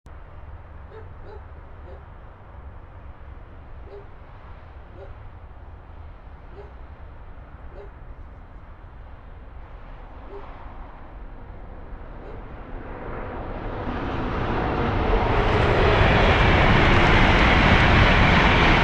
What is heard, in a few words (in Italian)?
Treno; Eigenmike; ComunePR; NuovaStradaF